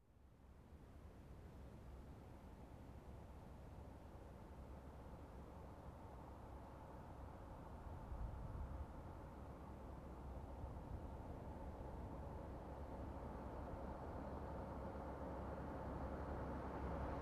Car parking and engine shutoff

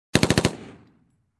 Machine gun firing short

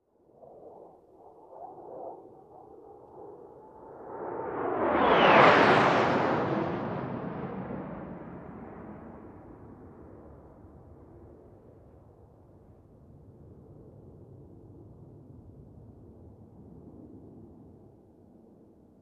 Aircraft on a runway taking off

